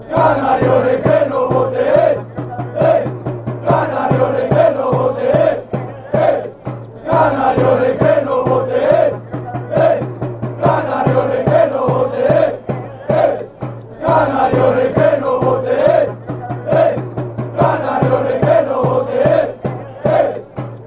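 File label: fanchants, football chants; doesnt jump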